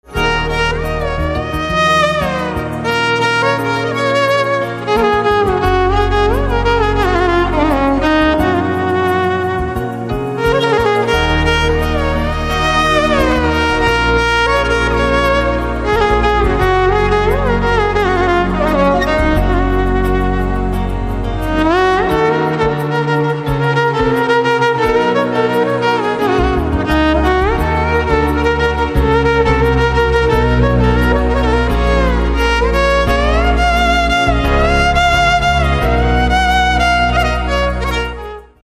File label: Violin